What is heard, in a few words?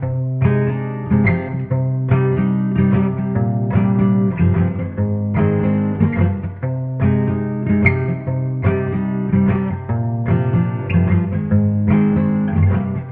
guitar screechy; Loops; Sad